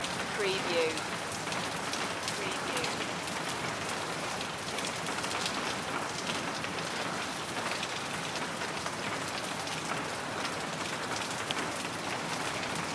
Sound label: rainy backyard ambience loop